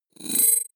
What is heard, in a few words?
sweep rattle click; sweeps-swells